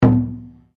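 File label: Drum Taiko forte, Japanese Taiko Drum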